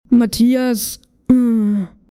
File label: ahh